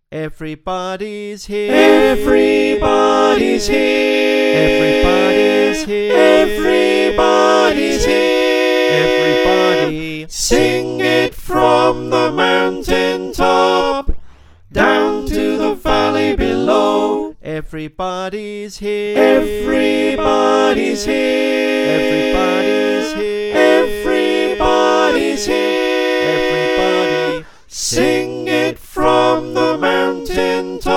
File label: SATB